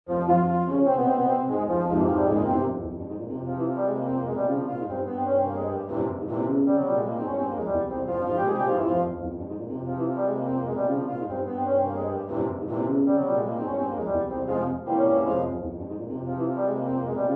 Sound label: Low Brass; Fillmore; Rolling Thunder